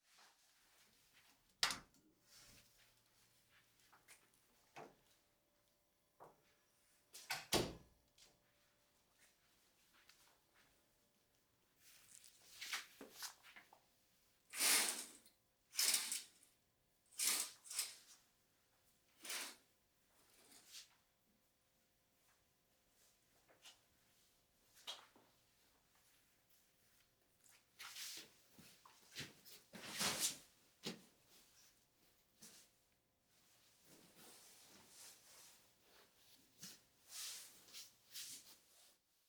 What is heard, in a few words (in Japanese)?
部屋に入りベッドへ, ASMR; 動作系